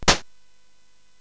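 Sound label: Nebulus, MOS 8580 SID chip music, games; CSG8580R5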